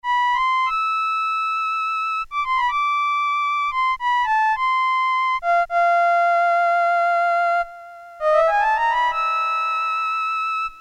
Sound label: violin, Casio MT-68; preset sounds